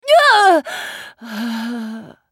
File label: dying; LeBlanc